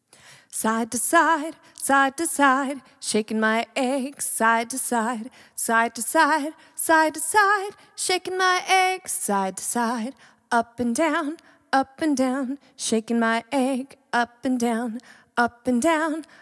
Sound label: egg shaker